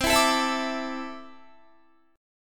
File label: C 6th Add 9th C down Guitar; C6add9 strummed